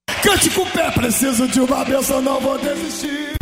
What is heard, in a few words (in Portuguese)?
Canto com o Pé